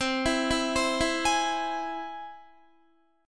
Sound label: C Augmented, Guitar, chord; arpeggiated